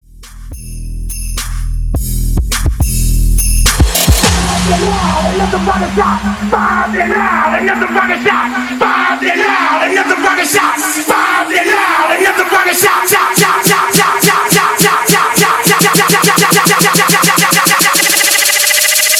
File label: Segue 105 BPM; Super Bowl Tribute